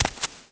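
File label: walk right, FOOT; The Elder Scrolls III Morrowind GOTY; XBOX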